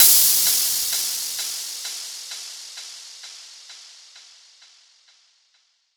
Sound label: Crash, Cymbals; Vengeance Samples